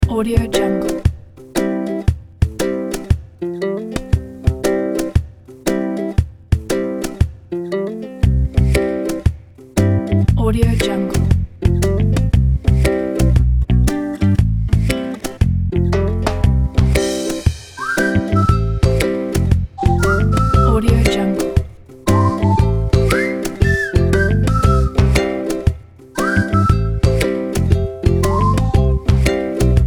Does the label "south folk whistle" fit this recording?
Yes